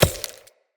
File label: Throwing Knives Impact Vegetation; Weapon Impacts; Environment